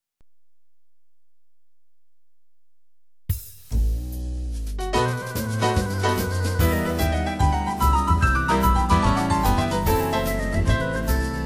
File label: jazz